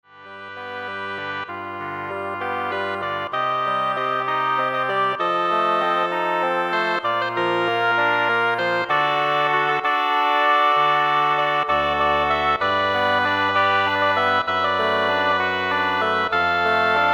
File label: Double Reed Ensemble